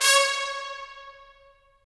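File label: brass trumpets staccato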